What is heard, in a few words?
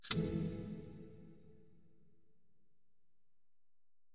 spin Button Quick Stop; fairyhansel